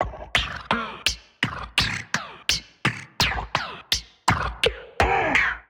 Beat Box Gate Kit 84 bpm; Percussion; Ableton; Factory Packs; Voice Box

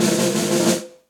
Snare Press Bright Room; Snare Articulation; Drum Hits; Session Drums Studio; Standard Hits

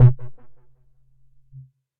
Pop and Split, percussive; Ableton; Factory Packs; Punch and Tilt

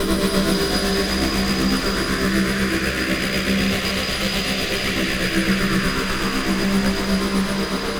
Nasty Noise Drone; Instruments; Laboratory

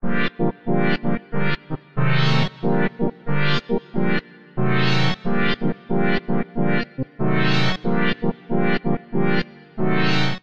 Constant Pad G Minor 92 bpm; MIDI Clips; Tonal; Accompaniment; Ableton; Factory Packs; Chop and Swing

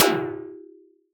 Alien FM Perc Short

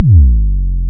modular tonal, Kickbank; Ableton Foundation FreePacks

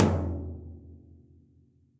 Tom Low Warm And Low; Drum Hits; Ableton; Factory Packs; Session Drums Club; MPE Hits

